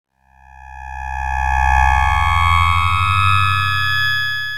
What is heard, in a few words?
saw tooth warped swell decay